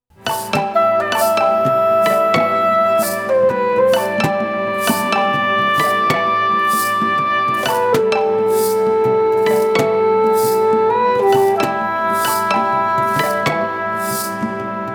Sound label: Oboe Shuffle